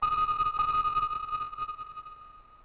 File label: Sail crit stop